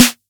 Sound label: Snr, Korg KR-55B